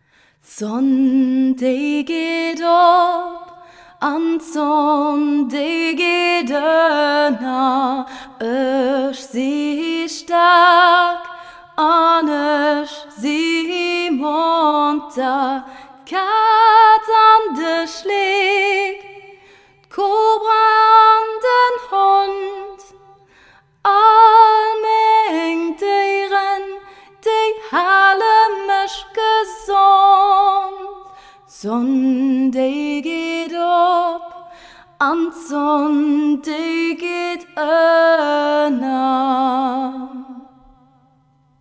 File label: voice solo